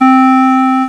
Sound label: CLARINET